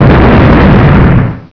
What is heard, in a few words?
Combo Exp; Ares™ Sneak Peek Demo